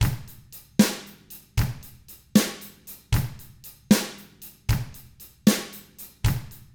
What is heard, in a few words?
Drums, R N B 6-8; ILIO; Double Platinum Drums 1